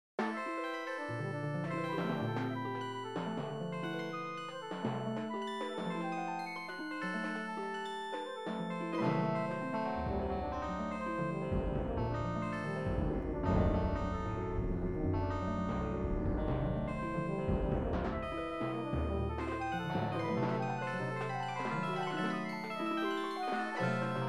minor chords and diminished seventh

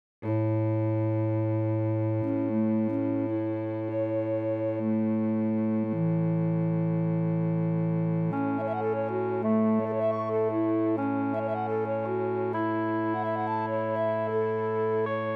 birdcall like

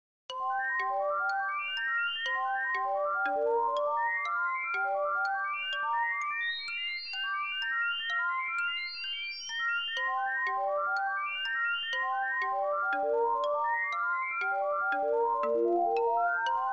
pigmie scale

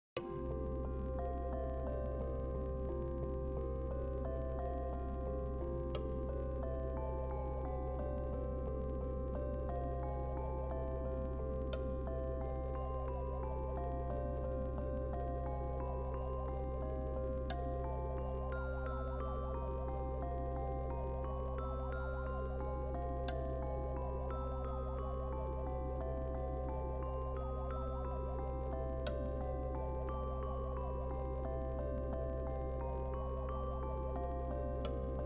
major and minor scales fractal

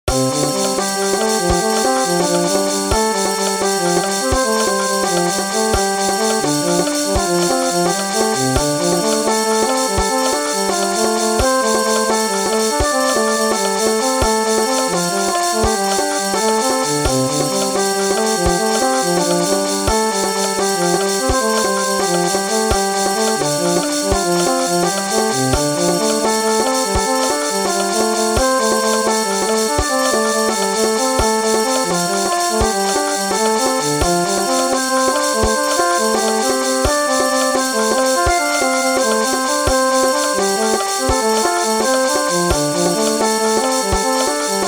Slendro scale polyrhythm

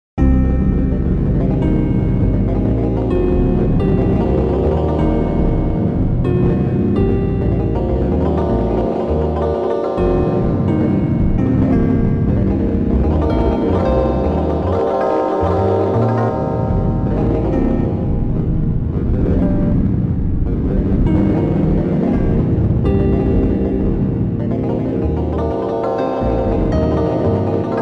eleven limit pentatonic tubular bells; fractal music; Sloth canons

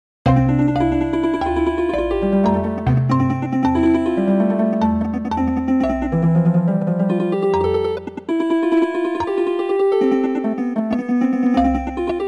four against five poly

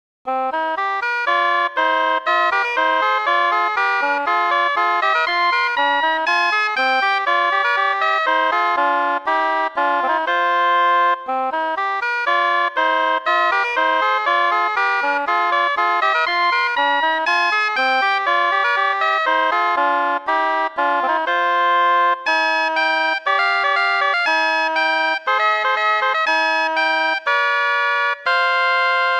13 limit 7 tone

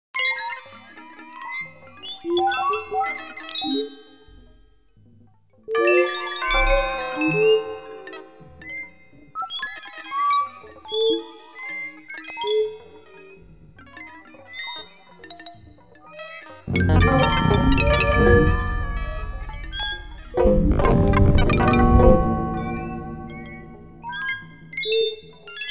truly noisy thoroughfare; fractal music; Transforming seed

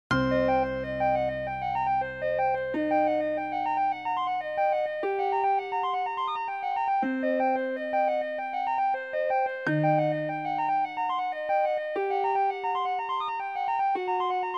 sevens lots of blues notes; fractal music

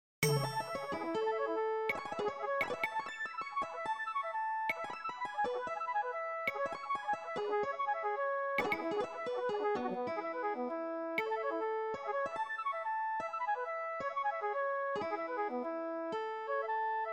flute and guitar with non melodic perc

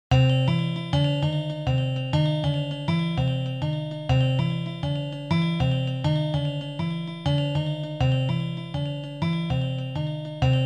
tone scape, fractal music Fibonacci Tone Scapes

